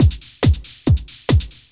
techno 140

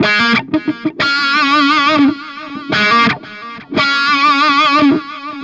Guitar B min, Rock

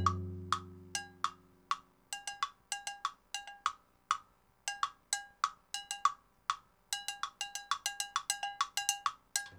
timb cow 100, latin, 100bpm; Sound Effect Library

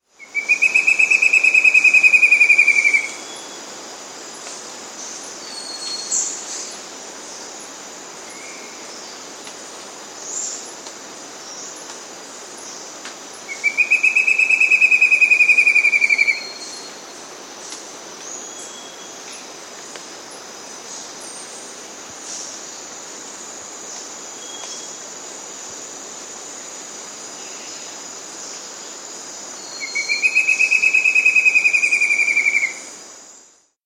Spot-Crowned Antvireo; S. America

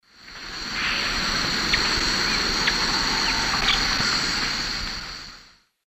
Micromonacha lanceolata, calls pair; S. America; Eastern Lowlands

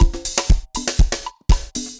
dancefloor perc 120 bpm

